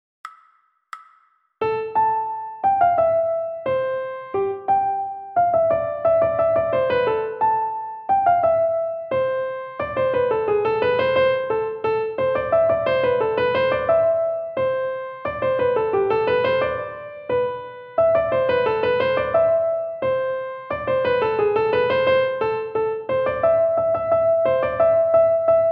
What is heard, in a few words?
fast, recorder